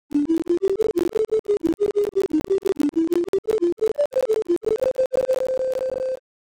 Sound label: wavesynth tune